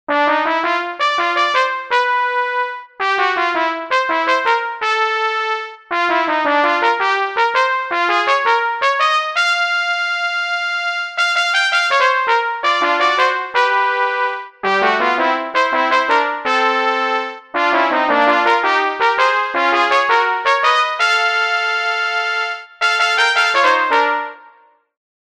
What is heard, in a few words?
Brass Trumpet Legato; Aeternus Brass; Syntheway